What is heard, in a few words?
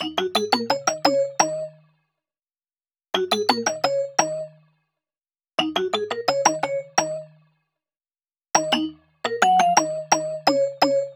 Melody Combo